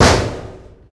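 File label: metal large, Impact; Cars; XBOX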